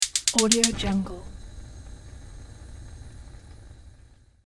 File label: gas ignition